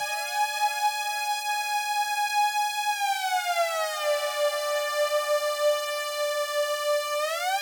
126BPM Melody; VFH2 126BPM Pong Kit

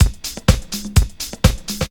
drum loop 125, vocal house; PS-28 Vocal House Samples; drumloops; houseworx

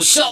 Vocal Oneshots; Vengeance Samples; Vocals

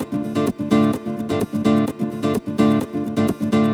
Nylon Guitar D min, 128BPM; Vengeance Samples; VEH3 Nylon Guitar Kit 2

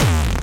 BD Distortion, Bassdrums; Vengeance Samples

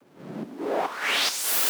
140BPM Noise 1Bar Sidechain, Clean Noise Ramps; FX Fills; Vengeance Samples

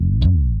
Slides; Vengeance Samples; VEH1 FX

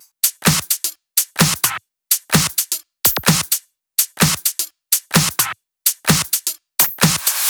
128BPM Drums; Vengeance Samples; Spring Kit

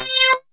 Synth Shot; Vengeance Samples

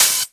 Open Hihats, Cymbals; Vengeance Samples

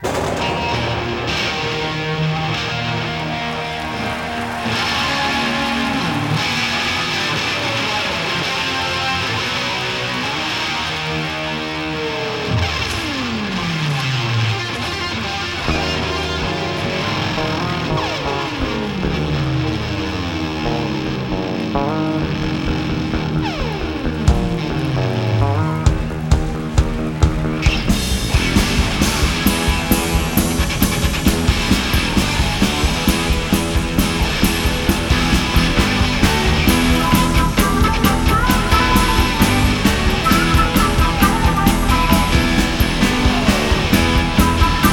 Instrumental Live

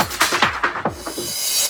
Loops Fills; Vengeance Samples

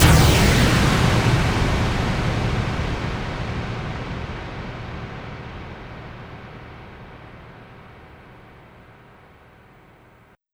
Downlifter

